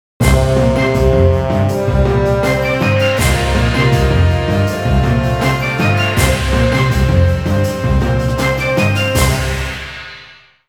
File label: Level Complete; Toy Story 2 Buzz Lightyear to the Rescue; Dreamcast